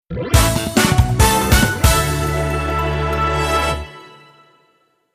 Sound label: Music Menu event